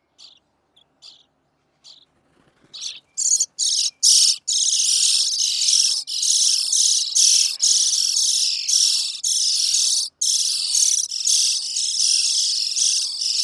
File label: European Starling